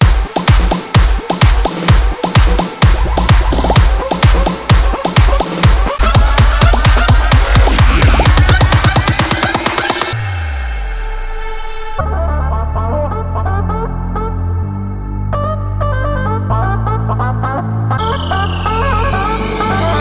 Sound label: Sax